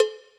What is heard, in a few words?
Cowbell